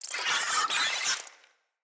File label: Infested Ashen Vocal Attack